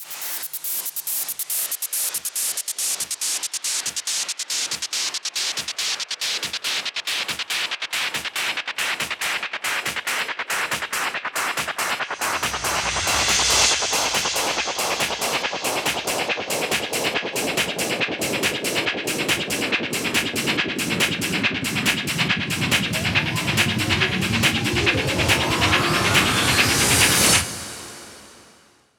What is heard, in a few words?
140BPM FX 16 Bars; Vengeance Samples; Snares&FX Pairs